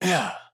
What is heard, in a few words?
Movement Evade; Batman